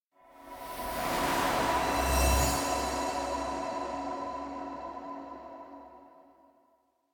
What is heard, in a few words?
elec pendant light fill; anemoi